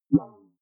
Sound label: query smooth, signale